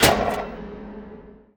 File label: select; Totaled; XBOX